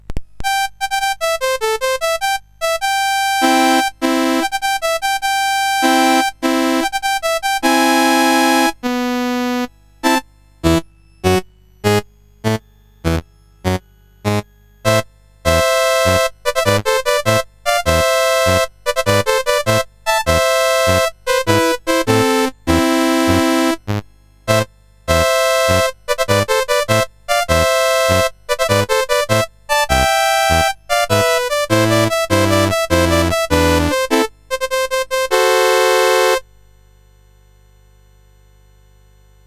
Season Jingles, CSG 8580; CSG8580R5

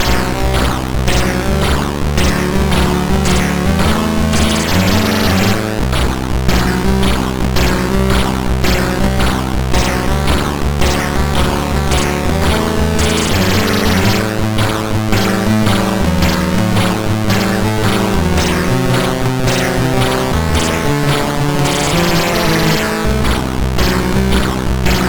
sid MOS6581R2, GAMES; F1 Manager

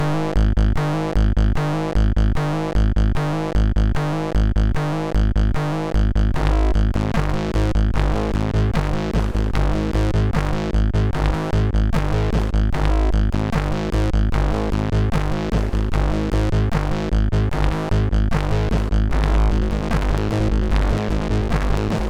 Small Riff, sid MOS6581

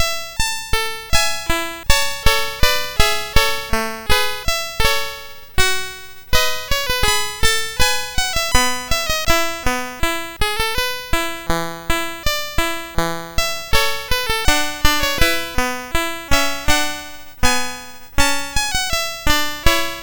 SX 64 Demo sid MOS6581R2; Commodore

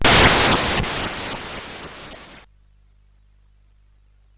Frantic, CSG8580R5

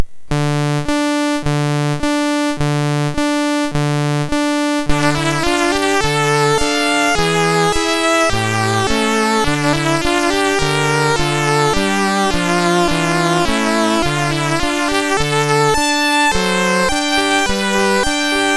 Lament, MOS6581R2; Embassy

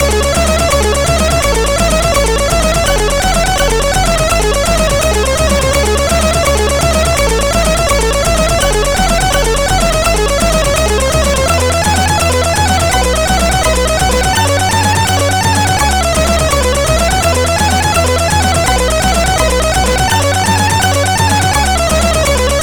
Changer Vie sid CSG8580R5; hvsc

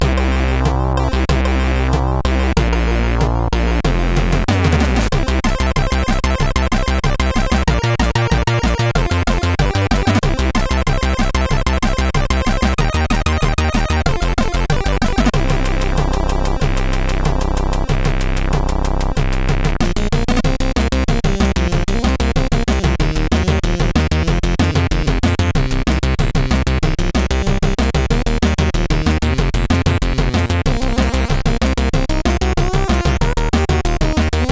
Rock, sid CSG8580R5

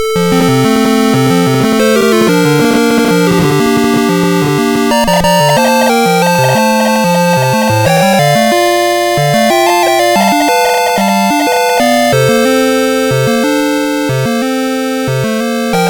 Musicbox, sid MOS6581R4

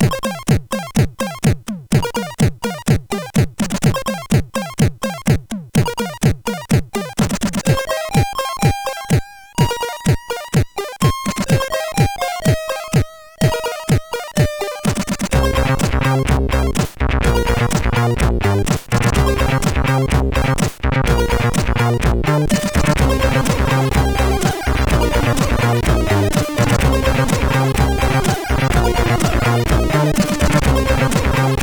Cool in Drums, CSG8580R5; sid

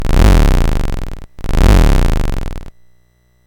BASIC sid MOS6581R4, GAMES; Sword of Fargoal

